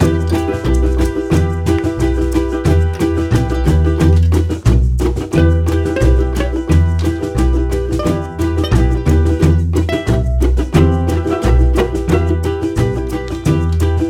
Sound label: ukulele of time - loop